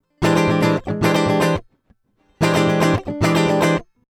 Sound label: Pre 73 Git Funk High Impedance; GAPremier